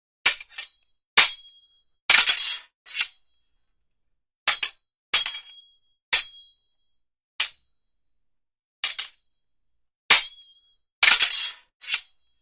Two swords clash against each other; cold weapons

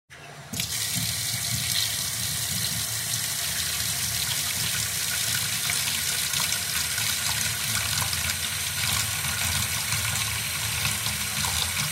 Water flows into a bowl

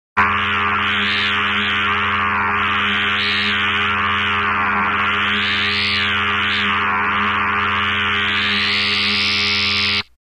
Electronic signal

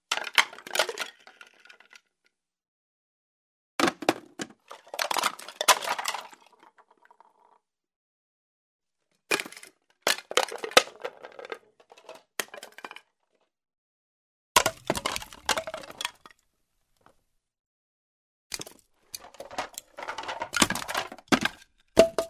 Throwing wooden sticks on concrete, ground, wood

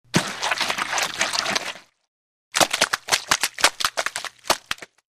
Water splash

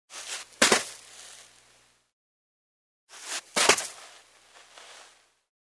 Barefoot jump in the sand; steps

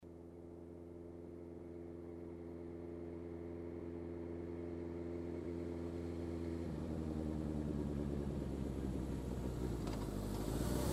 Motorcycle approaching, turning off

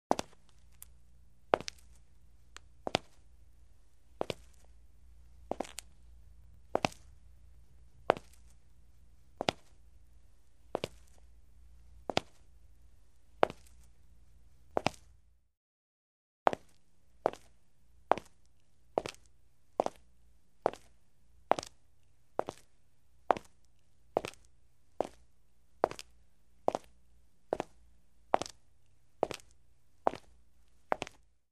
Steps on a brick floor in heels

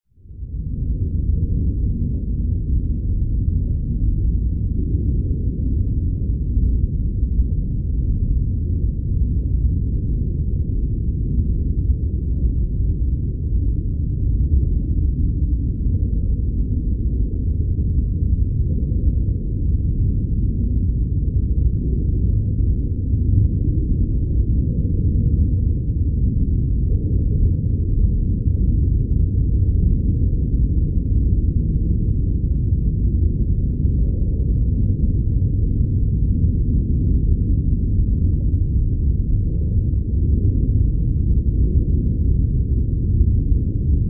Hum, atmosphere of depth, water

